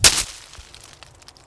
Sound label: bush; Mafie 1